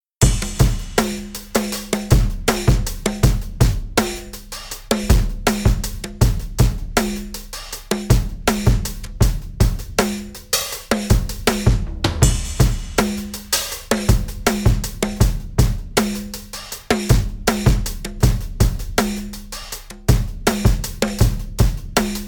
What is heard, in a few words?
8bk